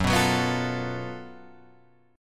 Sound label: Gb Minor Major 7th Double Flat 5th, Guitar, Open G, chord